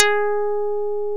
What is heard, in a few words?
HARP KARPLUS